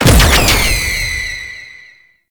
Infested Lich Summon Tentacles Cast; Warframe; Abilities; Zeke

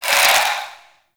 Mummy Scarab Swarm Augment Proc; Warframe

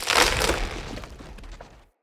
Root Medium Start A; Neathuns

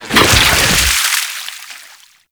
Liquify Impact; Pirate; Warframe

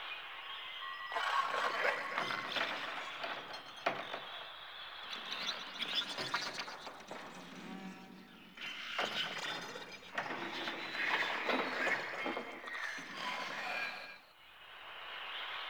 Camp Exterior Large Loop; Props; Loops; Warframe; Venus Open World; Venus Landscape

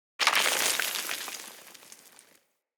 Generic Explosion Rocks Small; Surface Hit Effects

